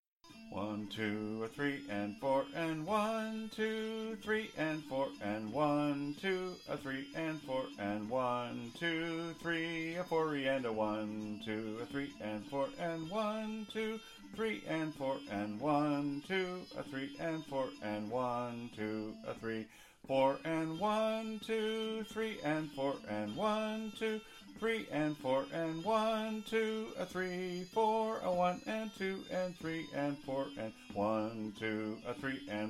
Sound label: Rhythm Sung, bagpipe